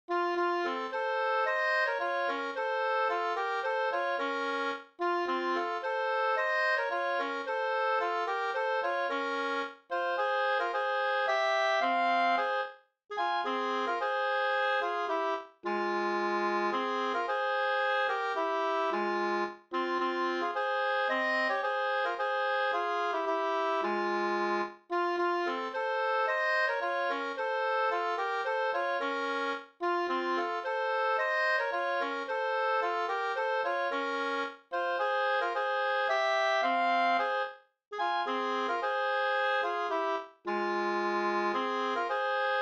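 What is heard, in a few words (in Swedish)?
polska, tvåstämmig; Kalmar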